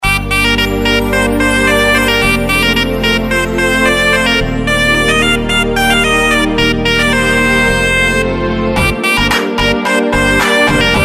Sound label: Malayalam Tune